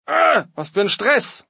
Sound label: being rushed